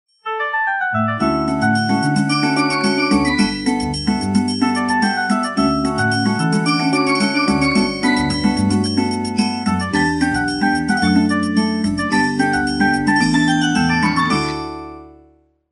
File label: Casual Celebrations, Ringtones; Samsung; SGH-Z620